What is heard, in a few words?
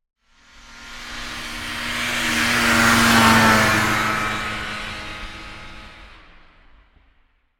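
Snowmobile 550cc Engine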